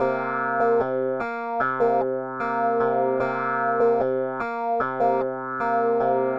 clave 2nd line; Sur une mesure; Funk Piano; Clave Second Line

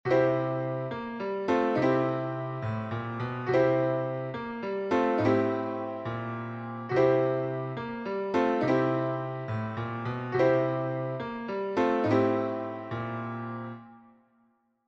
turn, blues piano; Blues Licks

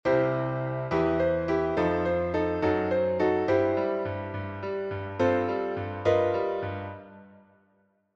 transition, Turnaround Fin -> Début, Blues Piano; Runs; Blues Licks

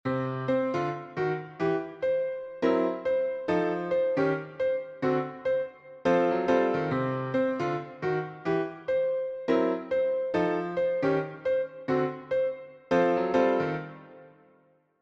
turnaround, gospel piano; Gospel Licks

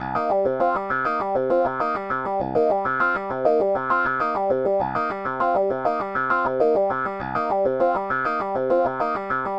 funk clavinet; Clave Second Line; Second Line 2 + 3

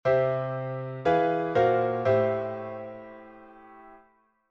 I - IIIalt - vi, gospel piano; Par au dessus :subV-i vers le vi : I-(III-subIIIalt)-vi; substitution tritonique de l'accord E7b9; Enchainements d'Accords

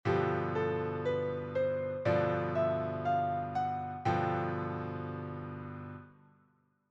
bebop dominante; Gamme Majeure; Gamme Majeure et Modes Associés

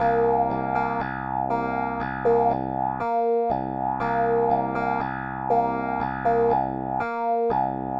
clave 2nd line; Clave Second line 3+2 Avec main gauche; Funk Piano

